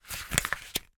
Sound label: foley card box small squash